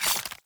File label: Grunt Death Gore Medium; Sinecure; Melee Grunt; Enemy; Death Effects